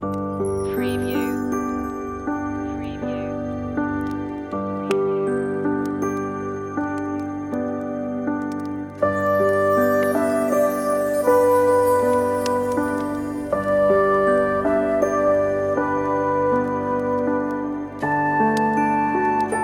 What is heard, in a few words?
Silent Night Relaxing Christmas, on-hold music; MediaMusicNow